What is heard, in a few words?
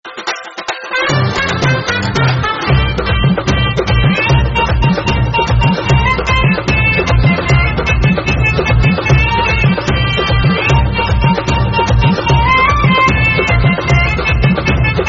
bgm